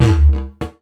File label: Didgeridoo Kit Samples